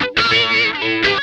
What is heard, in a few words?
RASP CRAZY, Funk Guitar; 90 s Sample CDs; Zero G